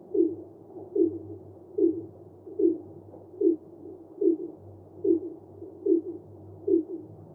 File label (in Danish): finhval sang 16 gange hurtigere